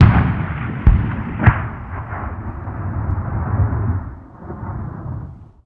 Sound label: artillery med; ModernWarfare